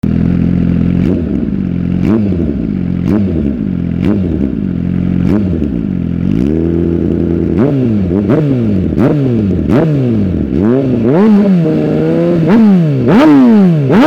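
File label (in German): Sound GSX-R1000 GPC-RS-GE, Auspuffsystem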